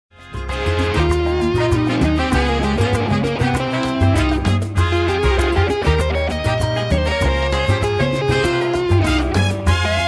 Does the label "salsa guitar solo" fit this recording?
Yes